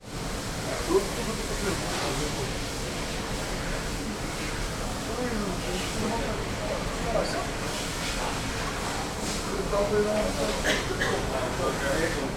transport boat ship ferry onboard cafe engine drone people chat close by french